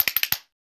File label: PRC BASH, Perc Toys; Spectrasonics; Supreme Beats; World Dance